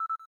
cam timer last

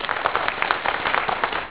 Claps

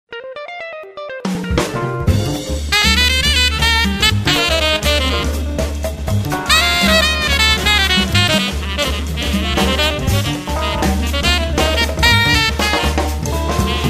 Jazz Bar; Samsung; SGH-T749 Highlight

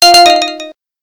Beep; lowbattery

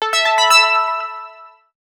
Grid Alert, phonetones; PlusFourSix; Rika (W705); Lars Edvall

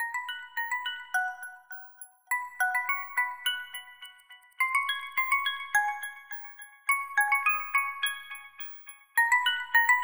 Alarm Jolt; alarms

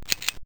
camera click short; Alcatel OT-991D

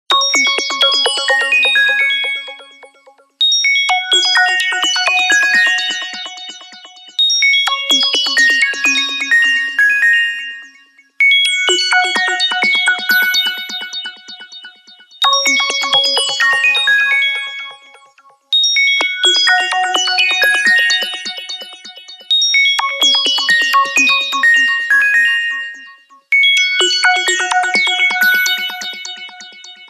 ring tonic; HTC Touch Diamond

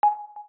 List up down, keytone; Samsung SGH-A257

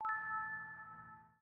unlock; Samsung Galaxy Express 3 SM-J120A; Particle

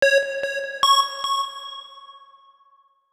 Echo Slide; Sony Ericsson; W595; PlusFourSix; Lars Edvall

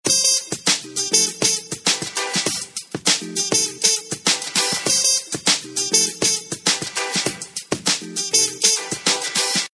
Retro Beat

